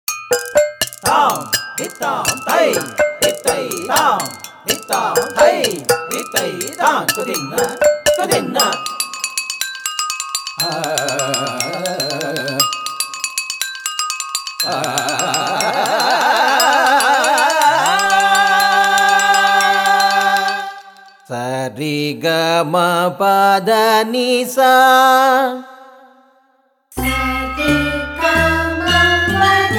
Music Track

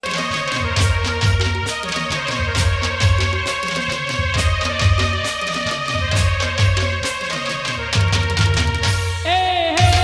Garba

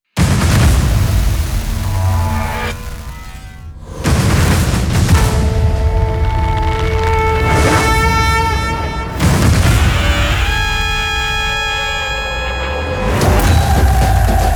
Theme Music

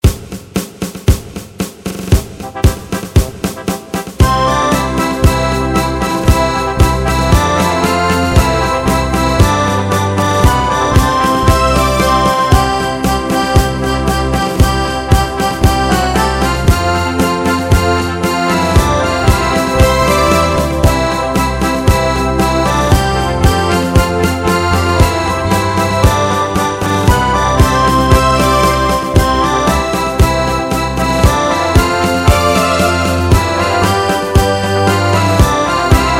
Jana Gana Instrumental

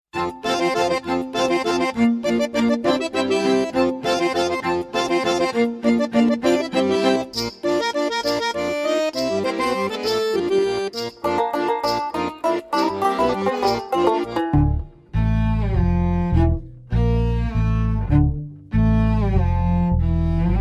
salsa

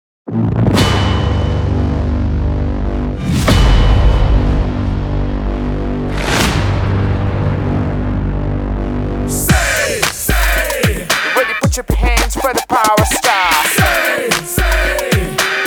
theme music; Power Play

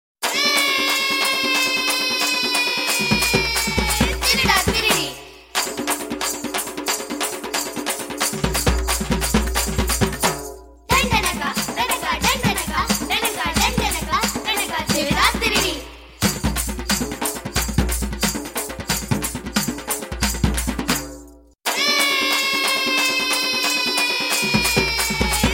Theme Music

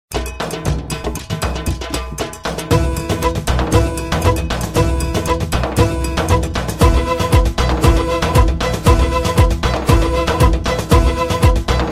Birthday Dance

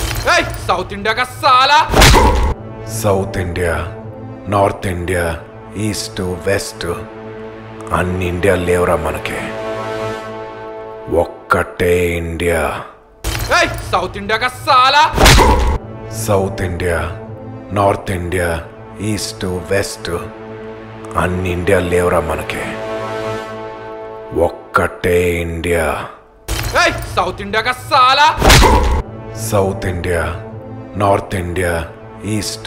Dialogue Impact

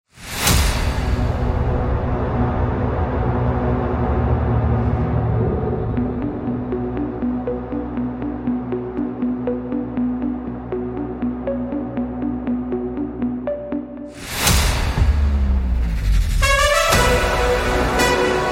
Theme Music; Super Star

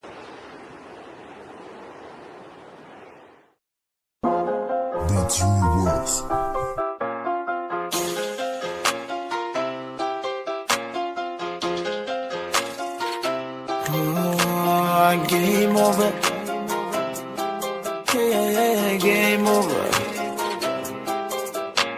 Game Over

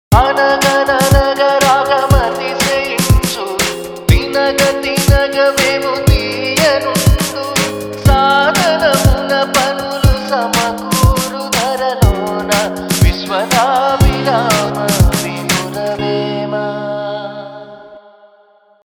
Vemana Padyam

